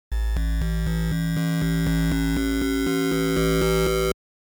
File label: fold, LSDj Wave Synth